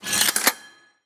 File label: war ui inventory customize realistic gun parts